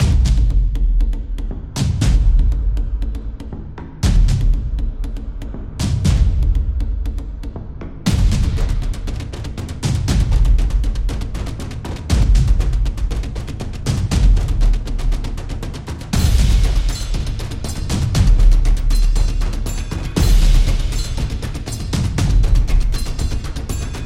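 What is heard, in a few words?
Paranormal, Dark horror background music; epic drum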